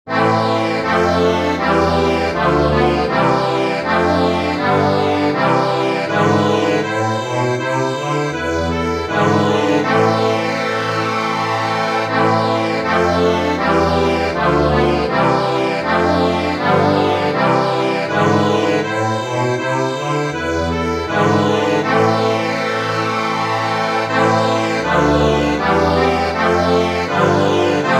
accordion

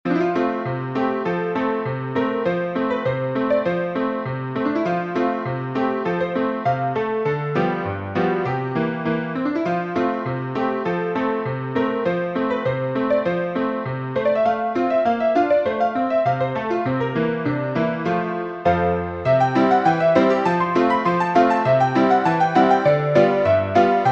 piano, sheet music

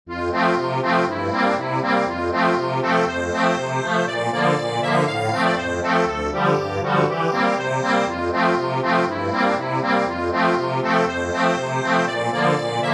accordion, sheet music

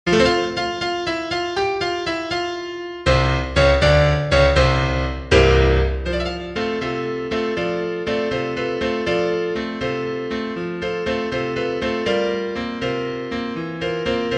piano, sheet music

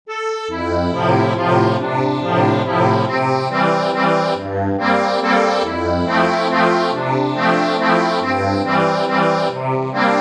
melody accordion

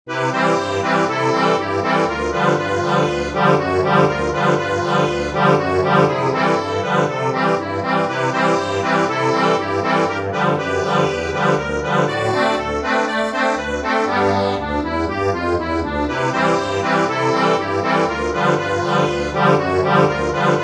Prince Eddies for accordion

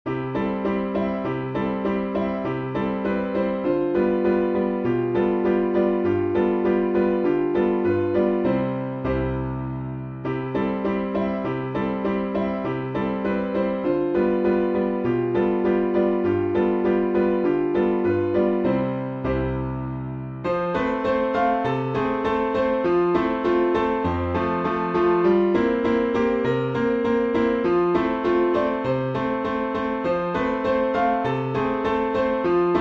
piano, sheet music

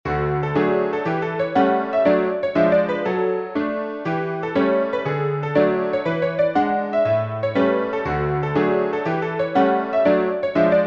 piano, sheet music

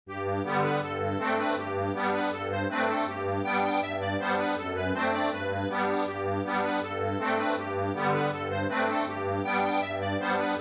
accordion

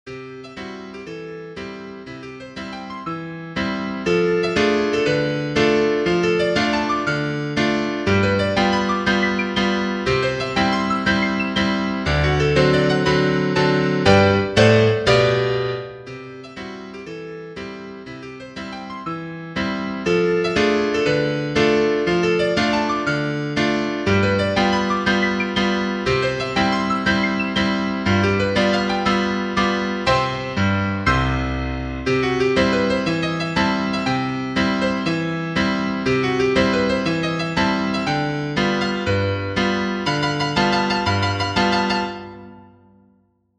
PIANO

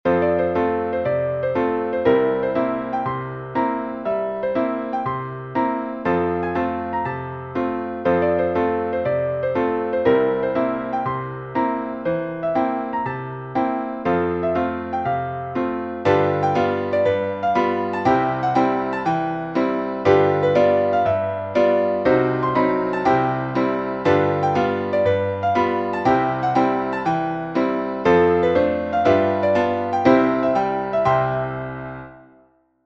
PIANO

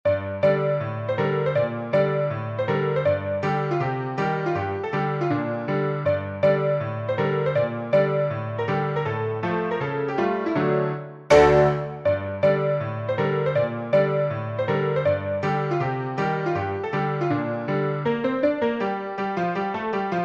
piano

